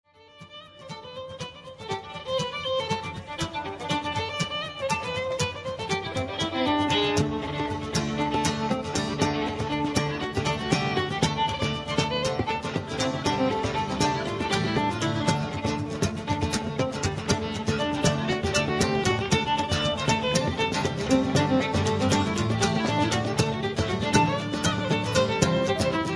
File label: modal reels